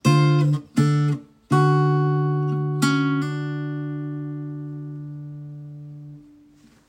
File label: urgenter guitar